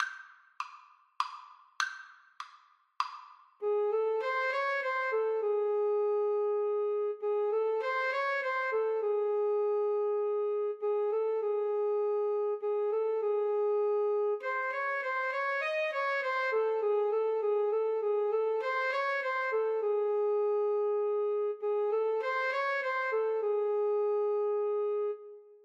Alto Saxophone Duet

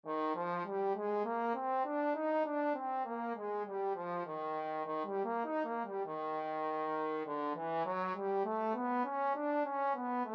trombone scales grade3

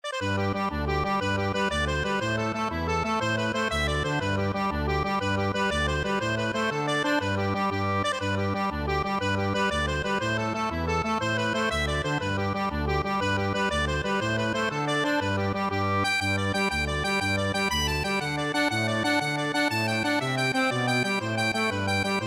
irish jig medley, accordion

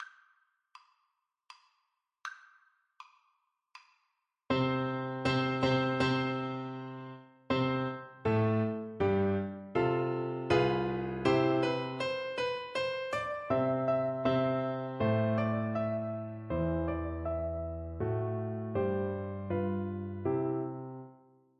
la celestina FL kar, Flute

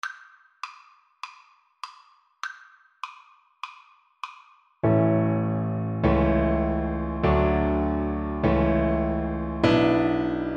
twinkle twinkle viola

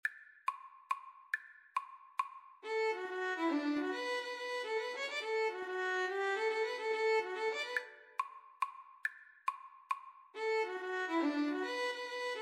will you come in, Violin Cello Duet

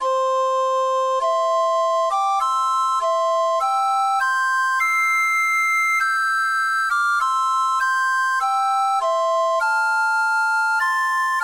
scotland the brave, recorder

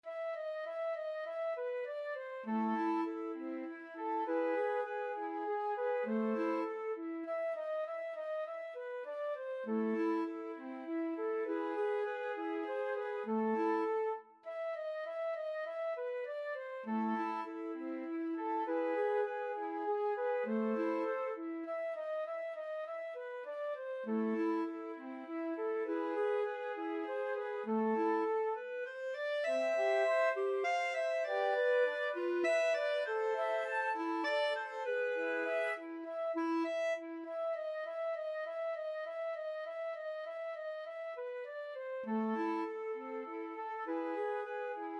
2 flutes clarinet

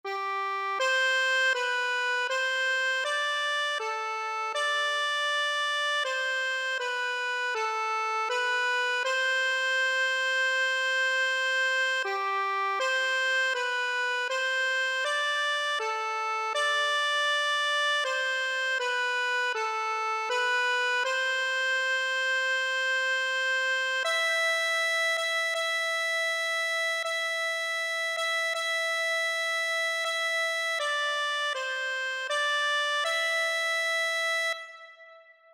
accordion

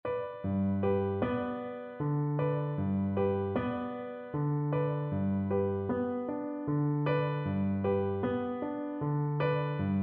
lullaby, Recorder

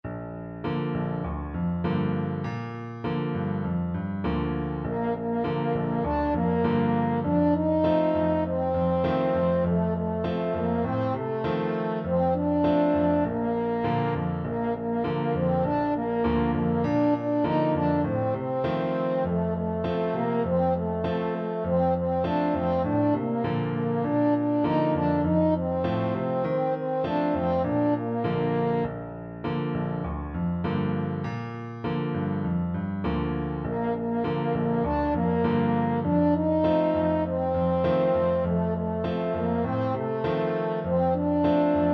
moja mbili tatu, french horn

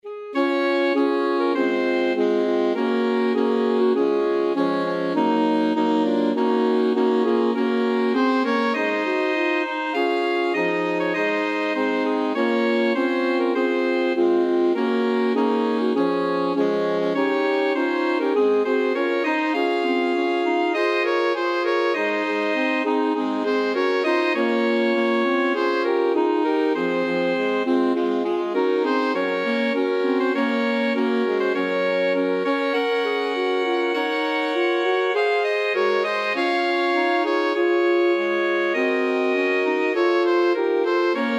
national anthem russia, alto sax quartet

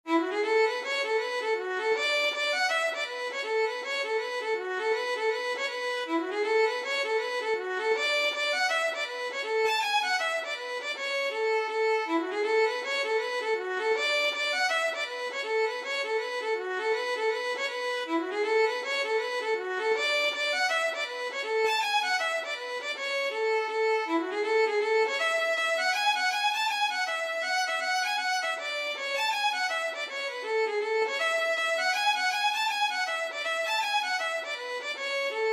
prize for the ladies violin

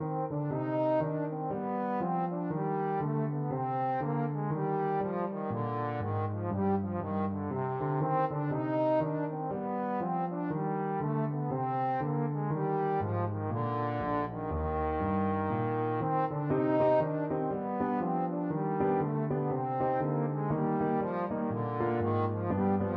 bach bouree, trombone

